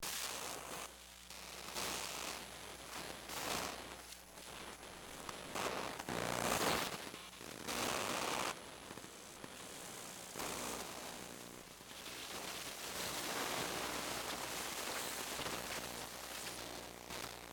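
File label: ambient exploration mecha arm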